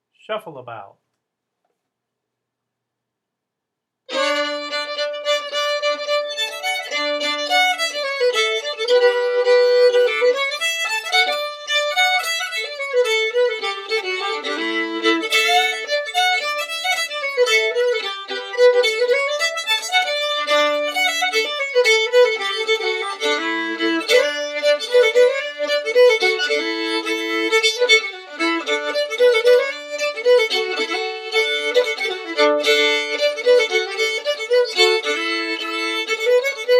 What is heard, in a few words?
fiddle; festivaltunes